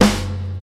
strong bottom end snare F sharp key, Royality free snare single hit tuned to the F# note